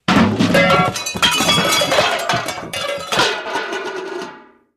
Comical Crash Clum, crashes

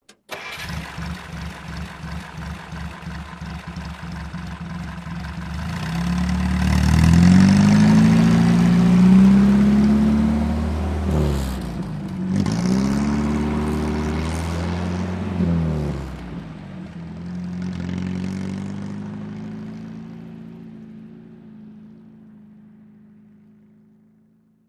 Army Jeep Start Idle S

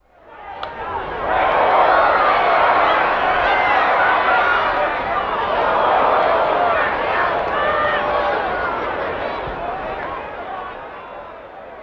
Baseball Game Foul Tip Crowd Reaction

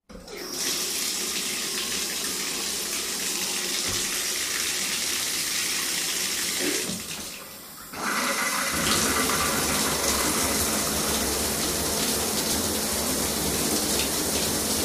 shower run water

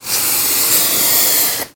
Lizard Water Monitor

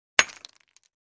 Brick Hit Hammer

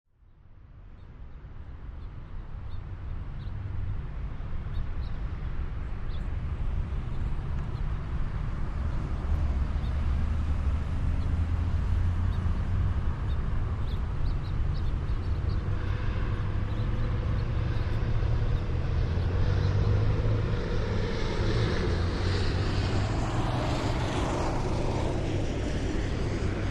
Heavy Commercial Air, airport

